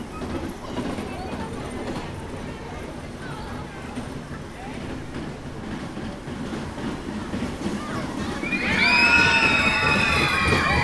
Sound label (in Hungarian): kukacvasút sikoltó gyerekek; debreceni zoo 2019